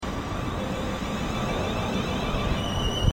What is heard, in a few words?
whine